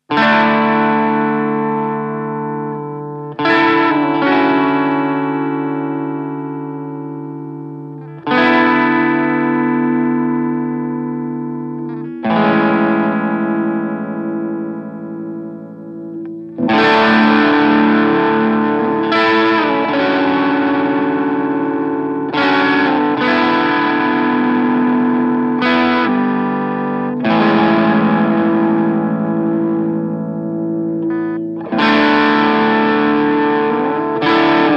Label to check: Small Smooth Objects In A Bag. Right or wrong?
Wrong